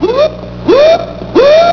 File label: ALARM; Master Clips Email Animator